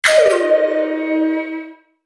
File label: Tribal alarm; Nokia; Audio themes